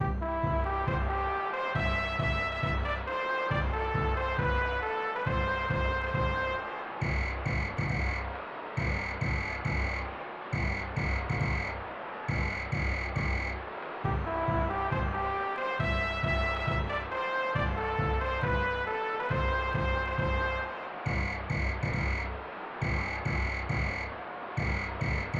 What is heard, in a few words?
Baseball